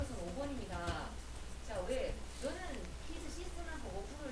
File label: cry